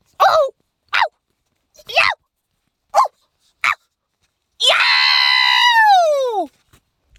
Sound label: Yeowch